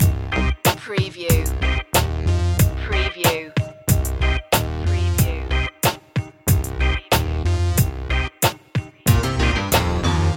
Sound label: urban cossacks loop